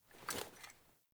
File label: Foley Action Default Sword Equip, Foley Default Clothing; Characters; Player